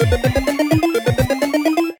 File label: win; Amatic; Lucky Little Devil